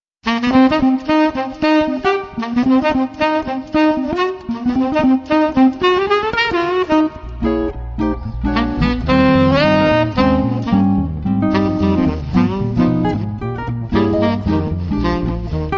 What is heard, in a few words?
on guitar